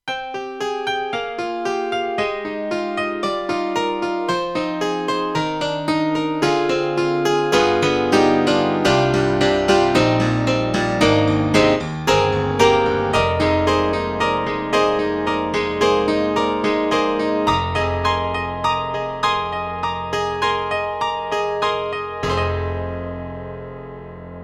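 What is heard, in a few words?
hammer piano, KORG 03RW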